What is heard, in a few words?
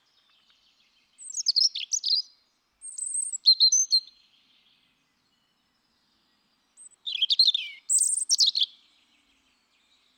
Erithacus rubecula